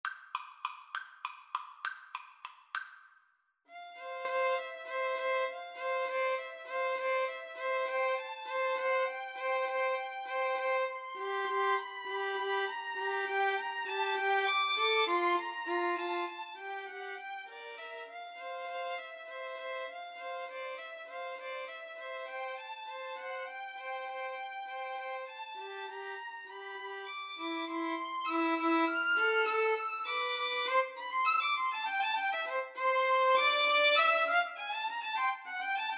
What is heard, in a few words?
waltz, Violin Trio